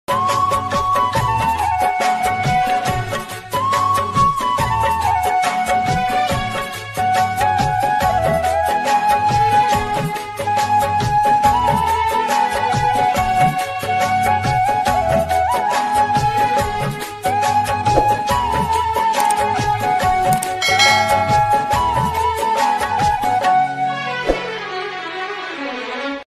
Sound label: sad instrumental ringtone music ringtone